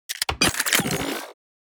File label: Sci-fi weapon reload gun reloading